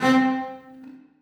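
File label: celli staccato c2; Sonatina Symphonic Orchestra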